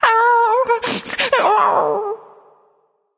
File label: boohoo; smite; voicepack; kukulkan